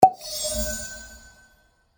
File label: Mana Potion; Monument Studios